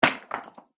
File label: impact rock rubble; Materials; Impact - Rock Thrown / Dropped Onto Rubble; Rocks; McKinneySound